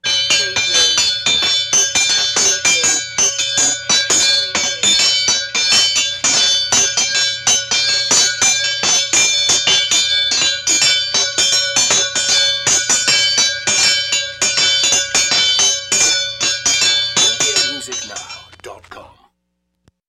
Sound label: Town Criers Bell